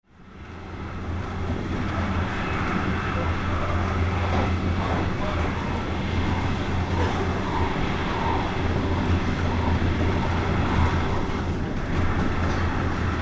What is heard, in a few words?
regional train cabin inside